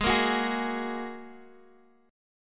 G sharp 7th Suspended 2nd G sharp, down, Guitar, Drop D